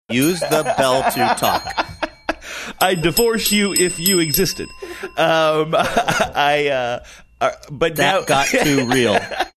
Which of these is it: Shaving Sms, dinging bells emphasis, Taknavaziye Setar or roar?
dinging bells emphasis